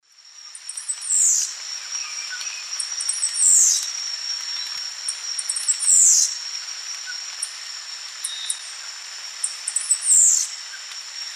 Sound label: Tangara xanthogastra, song; S. America; Eastern Lowlands